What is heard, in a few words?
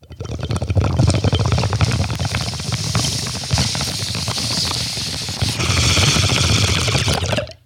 Bong Hit